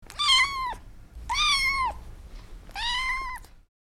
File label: Soft cute cat meow meowing; animal